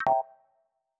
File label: Sosumi